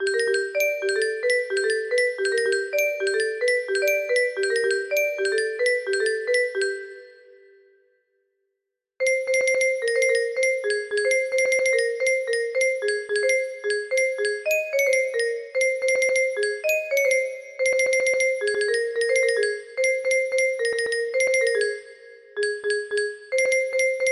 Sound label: music box cover